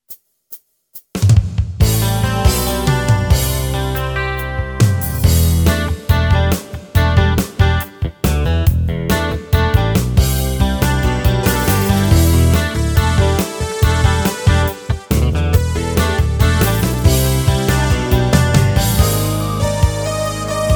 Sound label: Clean Guitar, Yamaha PSR-550; Factory demos